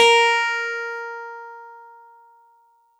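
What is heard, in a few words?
Easy Clav DX100 A#0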